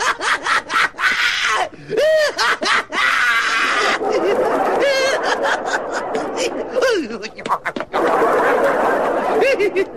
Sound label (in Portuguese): a risada do kiko